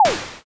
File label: stone throw